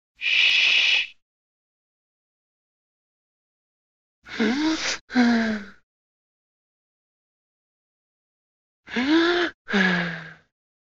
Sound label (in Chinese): 嘘的声音加大喘气音效